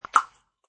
Pop Aresol Top, Popping plastic top off aerosol can.; Spraying; Objects